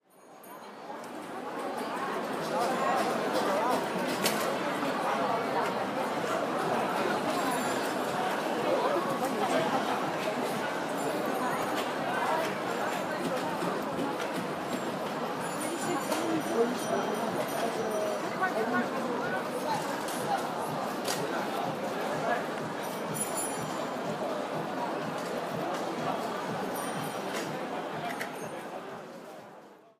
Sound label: the sound of money; Macau